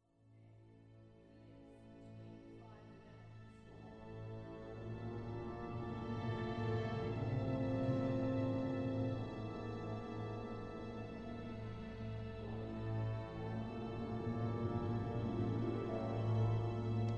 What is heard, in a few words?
orchestral excerpt